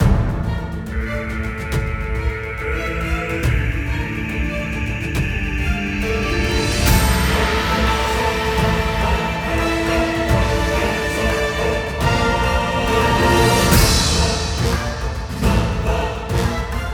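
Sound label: Boss Battle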